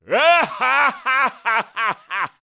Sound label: Laughing